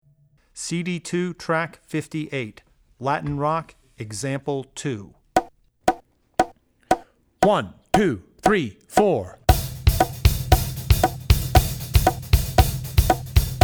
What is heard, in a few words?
Latin Rock; Latin Rock (Example 2); Drummer's Bible Tracks